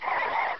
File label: zo alert, zombie; hl1 npc